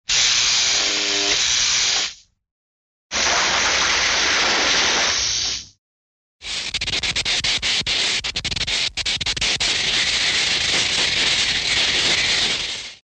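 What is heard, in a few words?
electric sparks sound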